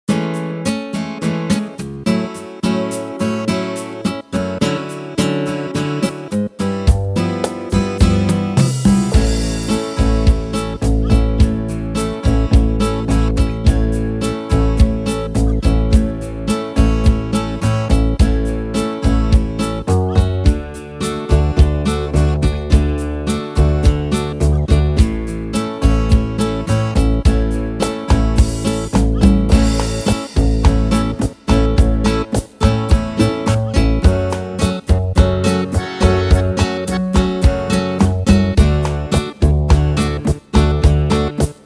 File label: Unplugged Bossa Style